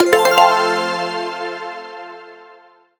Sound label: multi line win; Sizzling 777; wazdan